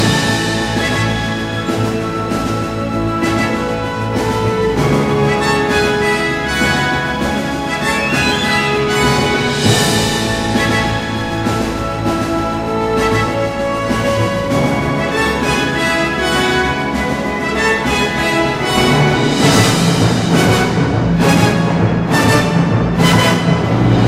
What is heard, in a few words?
orchestra instrumental version; KHII